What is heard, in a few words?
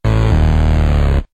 fail